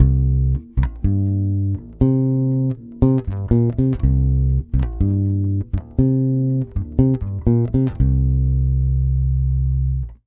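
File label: 120 BASS C minor 7, Brazil Bossa; USB Soundscan; AKAI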